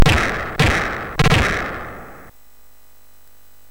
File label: Fast Sfx, MOS6581R3; hvsc